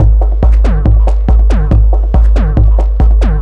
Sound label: TECHNO BEATS 140